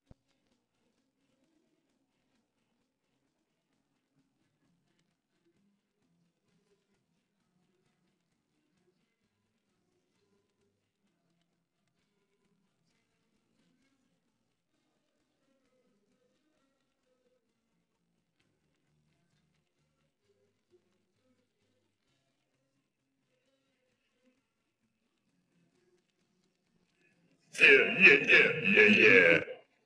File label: vocals